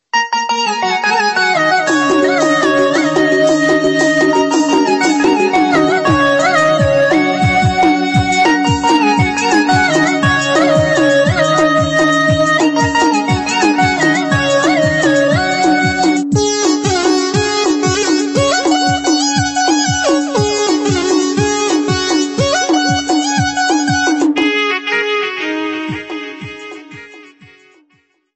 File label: NAVRATRI INSTRUMENTAL RINGTONE